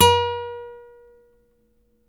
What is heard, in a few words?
NYL NV C, nylon no vib guitars; Spectrasonics; Hans Zimmer Guitars VOL-1